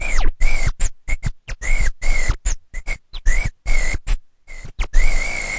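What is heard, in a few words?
turntable transform; Scratching and Turntablism; DRUM LOOPS and BREAKS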